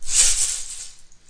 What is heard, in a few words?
rainstick shaker longer; ETHNIC and WORLD PERCUSSION